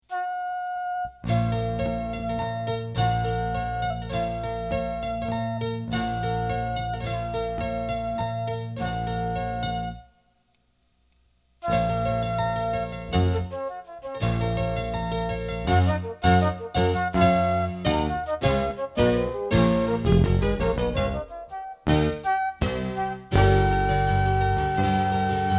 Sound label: Trio For Flute